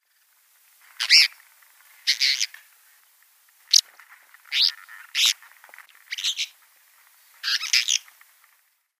Rock Martin calls

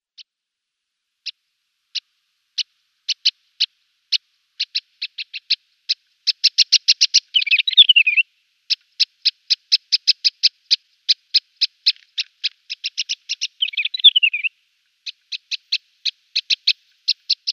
Scrub Warbler calls

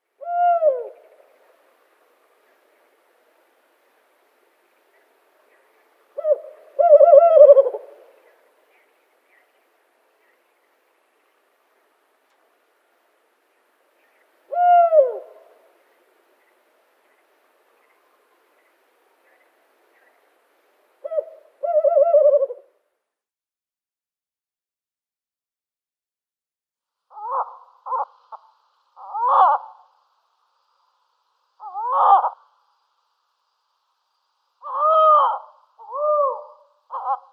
Tawny Owl mauritanica display

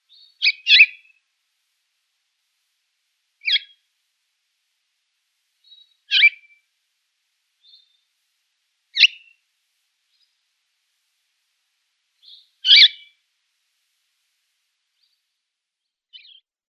Blue Chaffinch calls

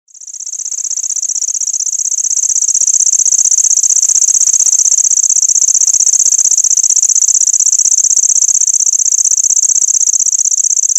Lanceolated Warbler song

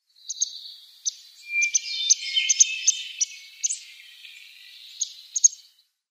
Palm Warbler calls

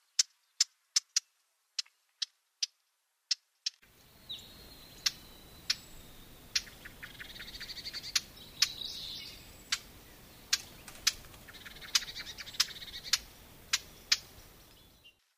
Eastern Orphean Warbler contact call

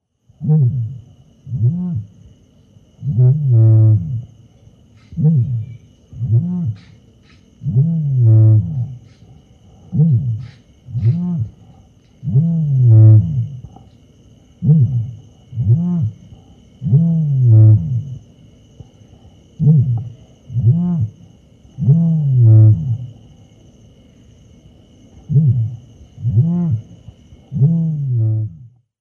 Ostrich male display

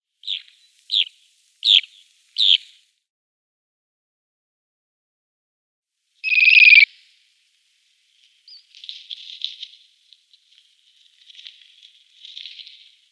Western Sandpiper calls

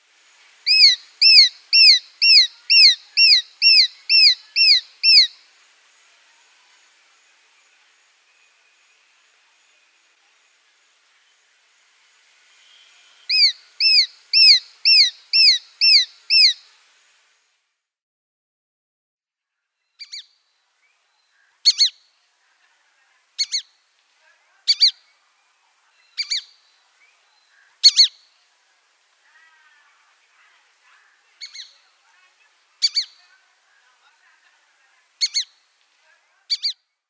Shikra

